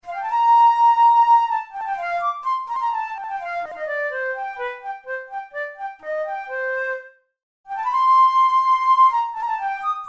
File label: melodic exercises, flute tunes